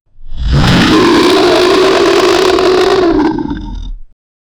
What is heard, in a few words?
roar, kaiju; godzilla